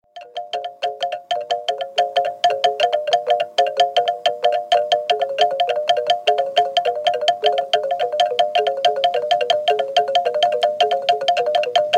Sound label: Baoule xylophone - Cote d'Ivoire; world music; AFRICA 1987 - 91; Ph. Ciompi